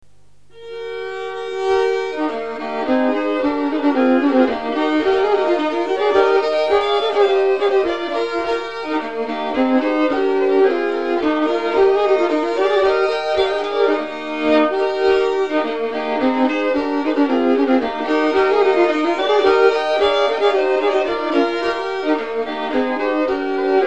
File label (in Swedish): polska D dur, folkmusik